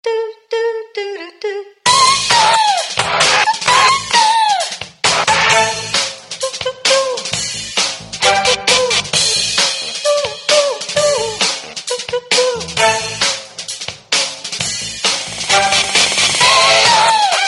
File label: Club Mix; Samsung SGH-A137